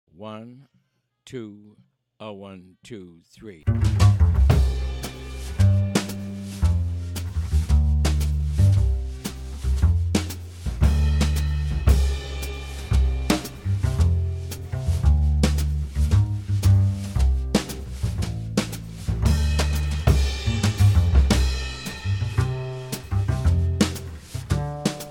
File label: bass drums only